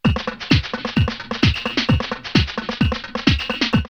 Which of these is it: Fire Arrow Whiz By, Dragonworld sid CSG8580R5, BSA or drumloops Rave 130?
drumloops Rave 130